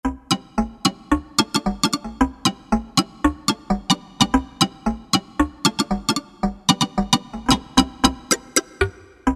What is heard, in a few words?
Pizz; Roland U-220; Factory Timbres